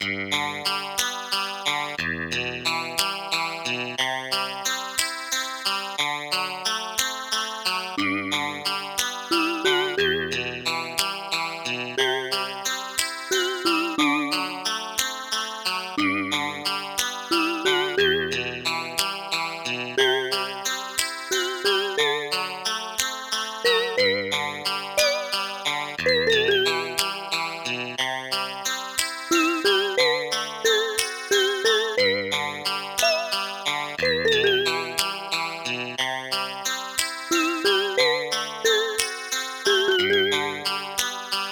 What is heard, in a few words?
Aircheck, Hi-Fi